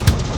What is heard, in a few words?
train engine start; factorio 2.0.55